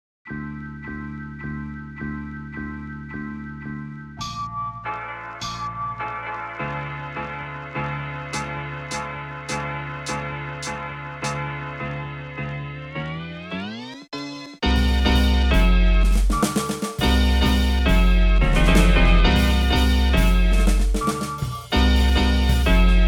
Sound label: plug n b lo-fi groove